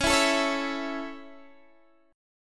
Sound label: C sharp Minor Sharp 5th C sharp Guitar Open D, chord